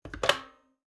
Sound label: phone pick up